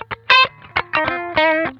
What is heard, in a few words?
Funk Guitar; Zero G - Funk Guitar